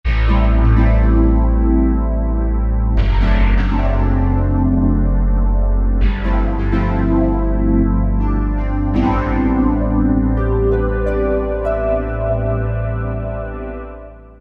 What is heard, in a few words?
Pluck Pad; Generalmusic Equinox